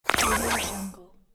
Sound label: electric charge up